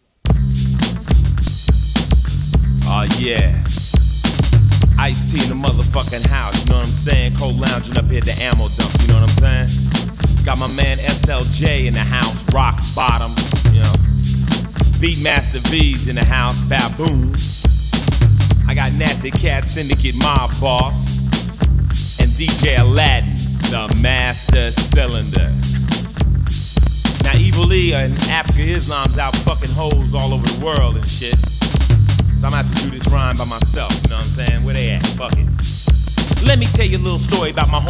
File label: Rap & Hip Hop